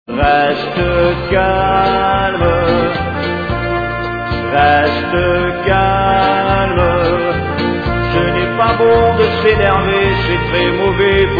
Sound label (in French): Pleurs